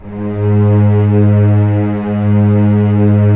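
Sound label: string section; Roland - LA Composer vol. 1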